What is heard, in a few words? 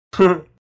short laugh